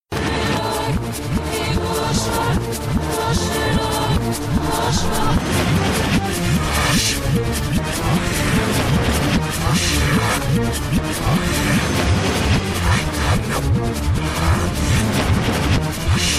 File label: rage music